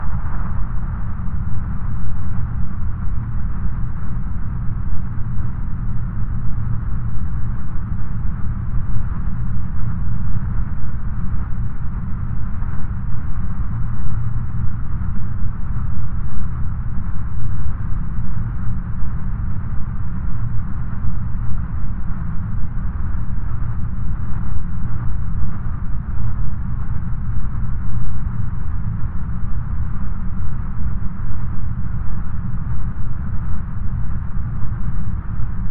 Imaginary field recording made on a distant planet distant perspective; SW005 Planets; heavy wind